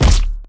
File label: hit; DayZ-Epoch; dayz sfx